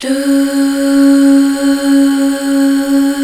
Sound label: HAL DUH F, HAL Back.Duh Fem; BACK. DUUHH